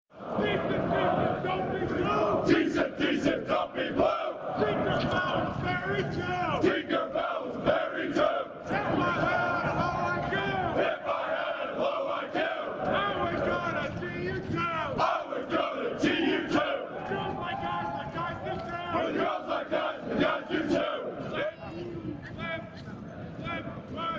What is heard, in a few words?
Cadence; Aggie T-sip